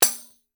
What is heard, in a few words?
tambourine sforzando; MIS Pitches - 2014; Percussion